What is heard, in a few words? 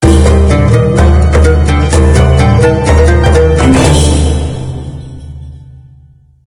task hard; Desert